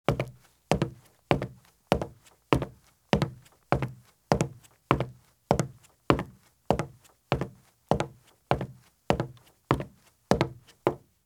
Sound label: Running Shoes Walk at Very Fast Pace on Wood Porch or Footbridge; Sound Ideas; Footstep Sound Effects